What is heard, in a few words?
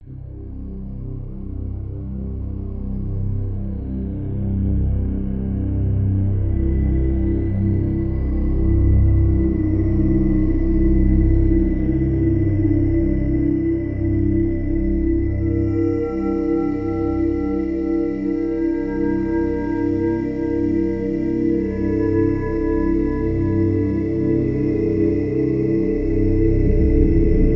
Ambience Theme; Metroid Prime Series; Metroid Prime 3 : Corruption